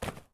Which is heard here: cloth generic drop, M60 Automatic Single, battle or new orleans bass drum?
cloth generic drop